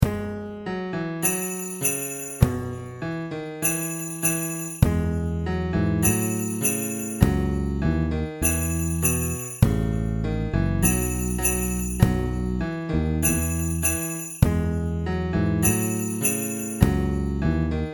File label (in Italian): le vocali base